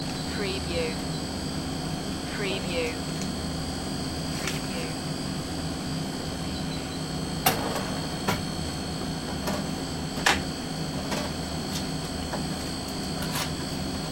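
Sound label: Convenience Store Ambience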